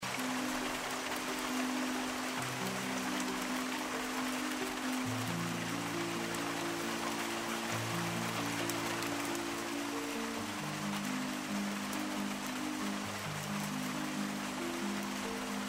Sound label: Rain; realistic atmo pack